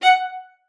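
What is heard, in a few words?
F#5, viola spiccato; Xsample VOL-5; 90 s Sample CDs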